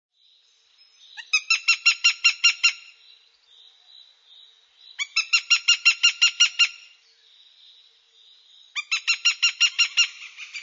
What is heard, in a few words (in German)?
Habicht Warnruf Horstnaehe; federwild